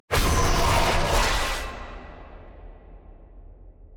ui button click; thresh; spirit blossom

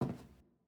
Footstep Wood

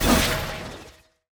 enemy boss dark binding projectile fast; bloom minigame

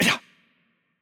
hurt light; bloom minigame

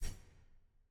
ui map event choice hover; demacia rising

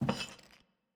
Footstep Plates

